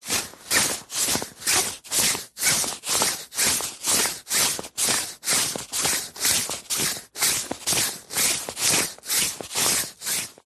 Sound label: foley clothes jacket thick high vis walking movement; zapsplat